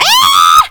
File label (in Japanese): 悲鳴; 音MAD; Cookie